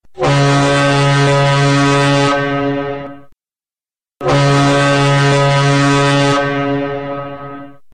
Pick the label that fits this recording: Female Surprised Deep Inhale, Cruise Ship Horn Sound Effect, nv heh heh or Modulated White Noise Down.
Cruise Ship Horn Sound Effect